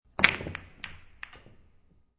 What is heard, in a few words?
Pool Break